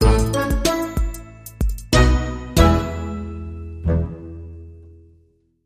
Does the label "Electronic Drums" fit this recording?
Yes